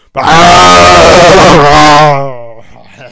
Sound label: SCREAM